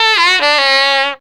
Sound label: DOWN DOWN, JAZZY SAX; Zero-G; Funky Elements